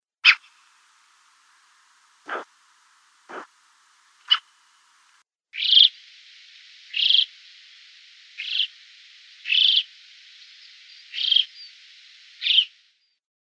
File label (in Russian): Galka alpijskaja